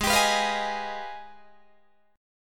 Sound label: Ab Minor Major 13th, down, Guitar, DADGAD